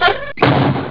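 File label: hotel door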